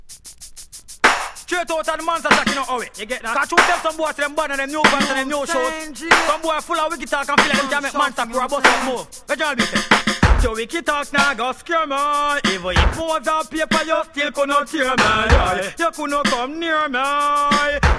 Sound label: riddim